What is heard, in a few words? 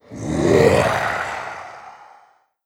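grunt battle, creature; GammaAge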